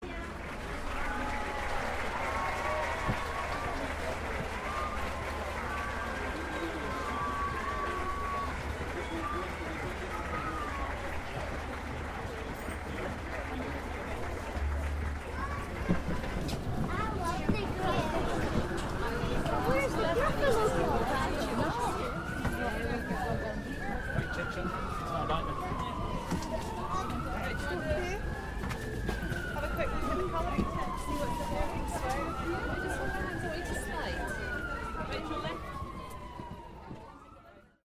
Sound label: audience leaving